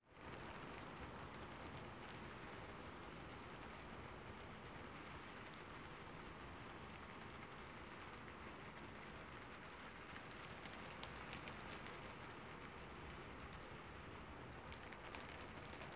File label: Rain On Rooftop, Ambient Sounds; Debarup Travel & Films